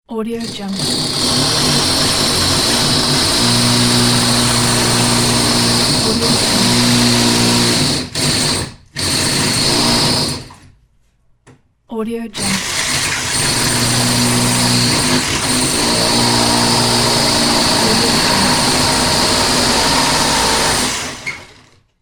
drill machine

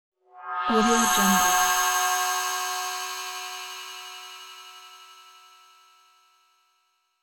whoosh effect shimmer swell; hollywoodedge

